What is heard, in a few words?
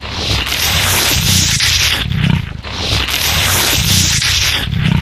vampire sucking, bloodsucker